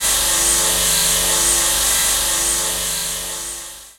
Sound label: laser; starruler